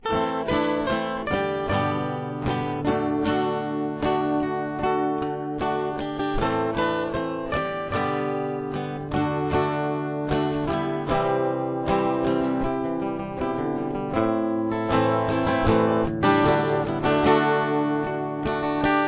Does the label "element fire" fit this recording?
No